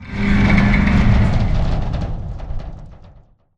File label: crane move stop metal stress; Titanfall 2